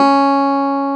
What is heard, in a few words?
CLAV C#4; Sonic Reality; Vintage Timetraveler; CLAV PIANET